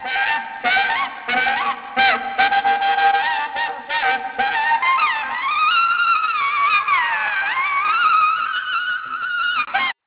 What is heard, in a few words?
saxo